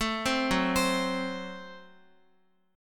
Gb Diminished Guitar; arpeggiated; JGuitar

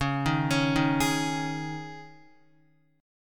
Db Suspended 2nd Guitar 4F,4A,5C,5G,5C,6E; Dbsus2 arpeggiated

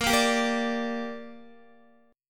Bb 6th, Guitar; strummed; x,13,10,12,10,10

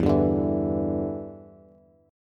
E Minor 7th, down, Bass; strummed

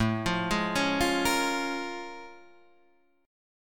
Bb Minor 7th A; Bbm7/A arpeggiated

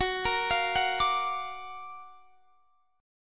Gb Augmented Major 7th Guitar Standard; Gb+M7 arpeggiated; JGuitar

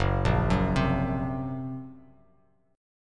Gb Major Flat 5th, down, Bass Standard; arpeggiated; JGuitar

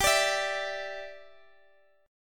G 5th 6th G, chord; G56 Chord {X X X 12 15 12} strummed

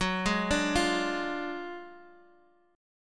D Diminished F sharp

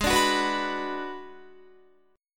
Ab Minor 7th Flat 5th Ab, Guitar; Abm7b5; strummed; 16,17,16,16,18,18

